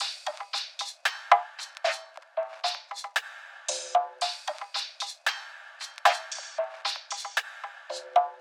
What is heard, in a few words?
Nova Perc Loop - 114 BPM; Cymatics; Fiji Hihat Loops & Percussion